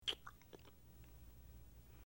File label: Kisses Sloppy Wet, lip smack